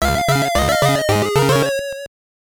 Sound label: Transition; Blue's Clues Blue's Alphabet Book; GBC